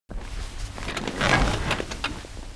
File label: car sit; Mafie 1